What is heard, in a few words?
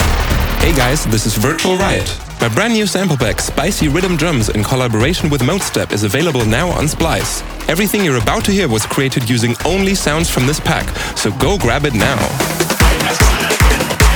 Spicy Riddim Drums